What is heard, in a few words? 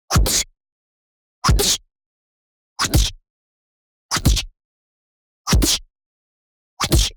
PUNCH Lead In High Punch Distortion; boom library; Anime Essentials